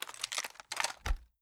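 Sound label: weapon foley assault rifle reload end; gmod; viper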